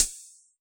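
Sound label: hi-hat MS Open, Yamaha DJX; 155 DJX Kit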